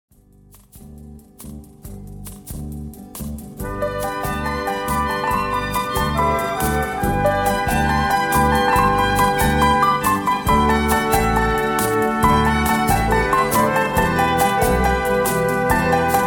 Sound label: PIANO; symphonic instrumental entertainment- and film music